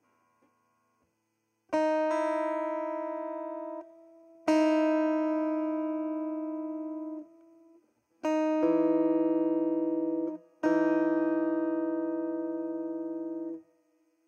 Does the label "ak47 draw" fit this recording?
No